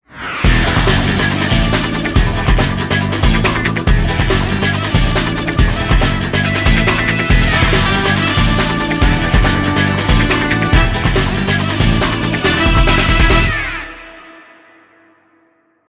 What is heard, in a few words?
Secret Agent Video Game LOGO, ringtone; MIUI14; HyperOS